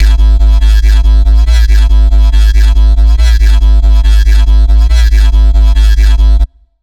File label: Bassline C3 140, Dubstep; GhostHack